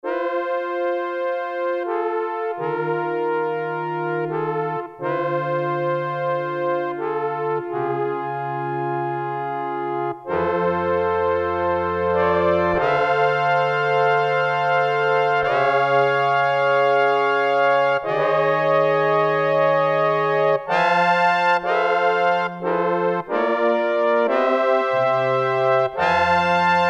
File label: MELLOW BLIP, Roland Jupiter-8, Factory patches